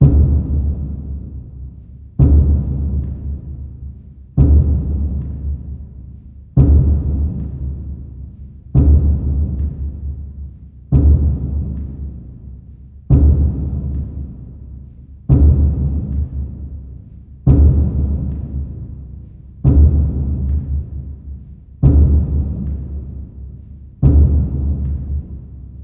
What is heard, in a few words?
Bass Drum